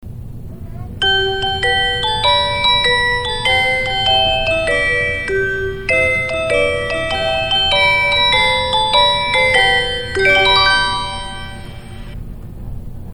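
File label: railway chime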